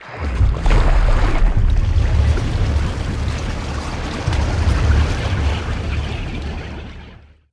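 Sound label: splash, seamonster; giants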